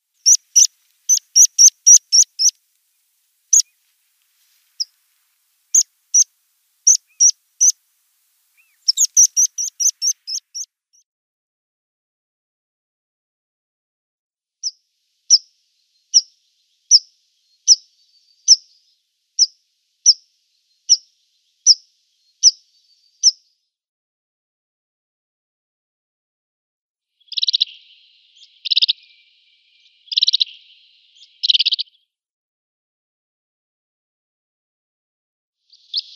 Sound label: Meadow Pipit calls